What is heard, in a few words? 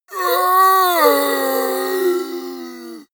monster growl hoarse